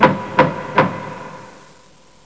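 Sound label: DOOR KNOCK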